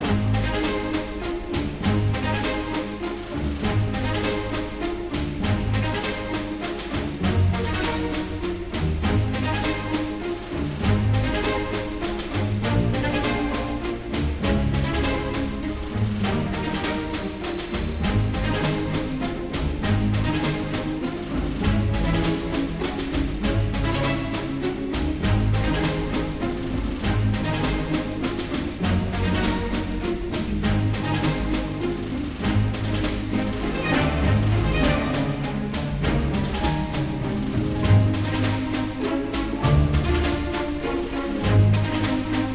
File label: Woodburrow (Attack Dummies)